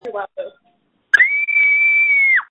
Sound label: screams